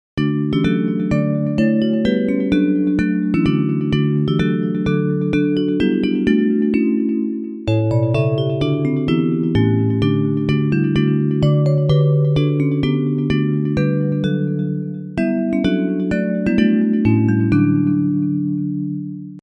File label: While Shepherds Watched Their Flocks Bells